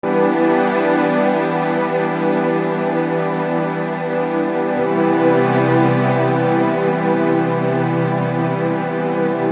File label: String; Sonic Foundry (Sony Creative Software); Infinite Soul VOL-1